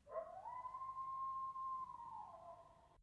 coyotes; weather and nature

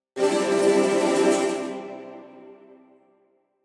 lute Cadd9 strum tremolo; musical instruments strummed plucked

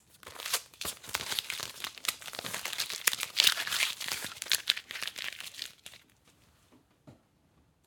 masking tape stereo crumbling; fvtt sonniss gdc audio

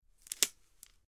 footsteps twig; weather and nature

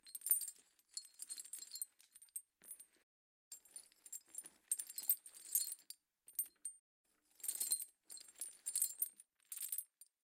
jingle chain sustained foley; fvtt sonniss gdc audio

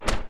shield hit; Combat

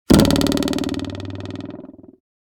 cartoon arrow; sonniss gdc

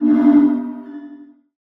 swoosh meta l r; sonniss gdc

